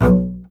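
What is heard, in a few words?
STR CBS, Solo Instruments, double bass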